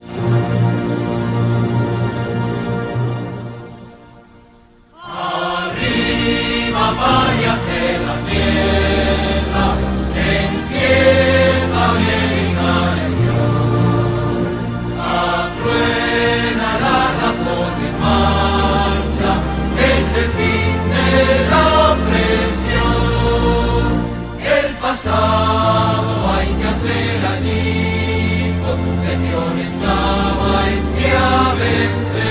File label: Internationale - Spanish; music